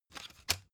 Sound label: cd in cover fast